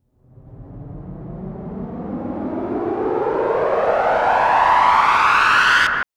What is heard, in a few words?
Reverse Fx; musicradar